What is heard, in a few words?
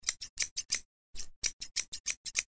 scissors